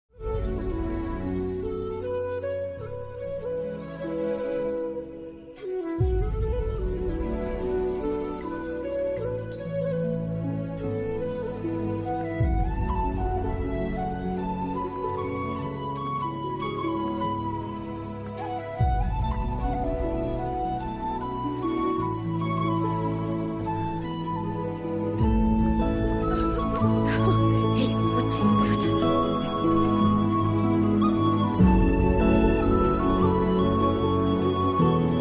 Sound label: Bgm Flute Ringtone